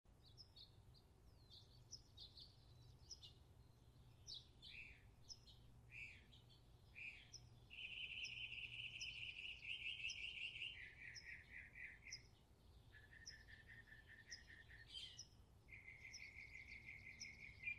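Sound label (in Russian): хороший чистый фон насыщенный щебетанием птиц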